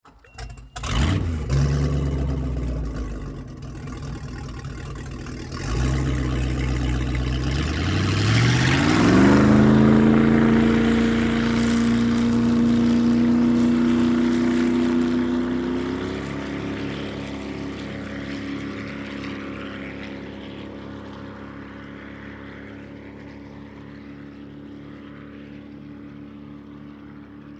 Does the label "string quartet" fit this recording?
No